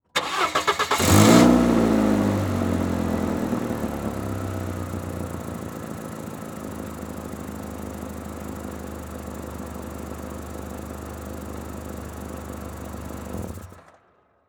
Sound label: Saturn Start Idle Shut Off Mic Under Car; Auto; Transportation